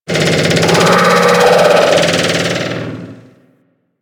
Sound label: Thumper Bot Spawn Groan D; Warframe; Grineer